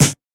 00s Long Room Reverb Snare One Shot D Key, steel snare drum tuned